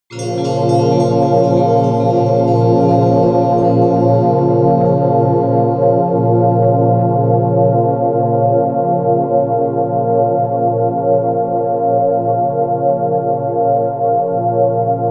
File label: spiritual, music elements